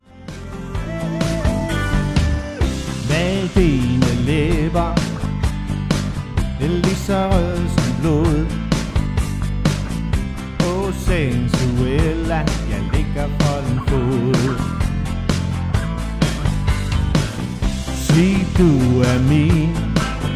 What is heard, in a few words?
instrumental